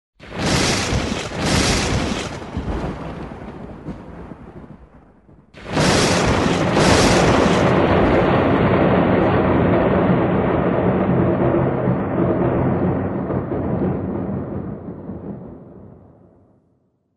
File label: Thunder; lightning machine; audio activated switch lightning machine